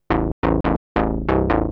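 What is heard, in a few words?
SYNTH BASS DANCE 140 A; eJay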